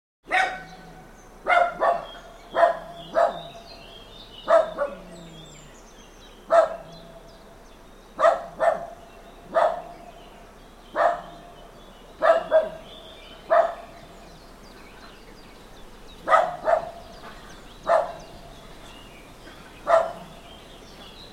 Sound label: Small Farmyard Dog Barking; Hollywood Edge Historical Series; Traffic Free BG's & FX